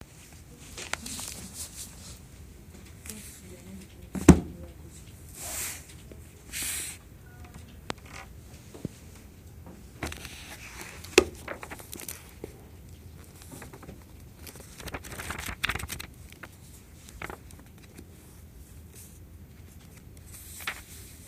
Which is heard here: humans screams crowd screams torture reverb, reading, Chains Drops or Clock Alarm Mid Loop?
reading